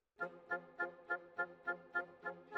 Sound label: Oboe